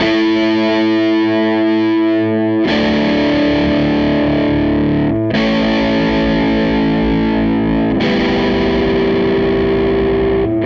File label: Les Guitar Loop; Sonic Foundry (Sony Creative Software); Six-String Orchestra